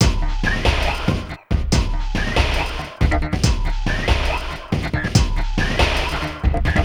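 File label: Loop, beats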